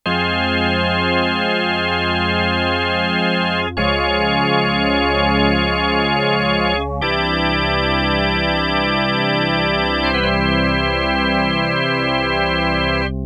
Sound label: FULL-ORGAN, Korg DW-8000; Factory Patches